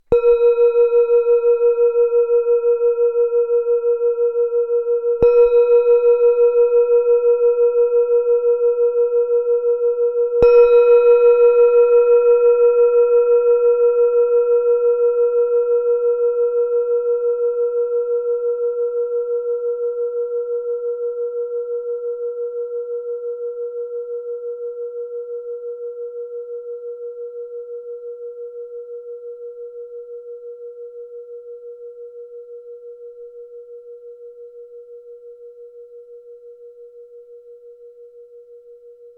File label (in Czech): tibetska misa